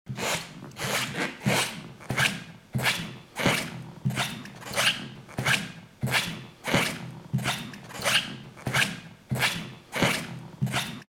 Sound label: steps on rubber